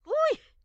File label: grandma short yell; Blind Drive